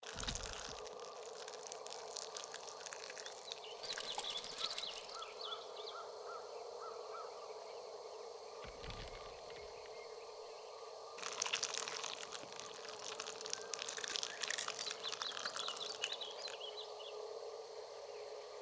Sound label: Mountain Pass; North East